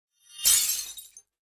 ui talent level lose

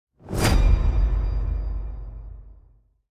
ui king match lose

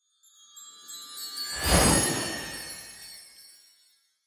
ui talent unlock talent point

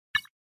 ui lottery

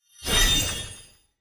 ui talent level up

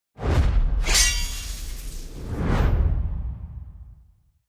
ui battle start

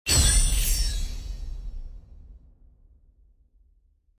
ui battle win

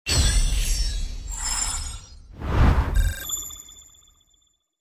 ui battle reward

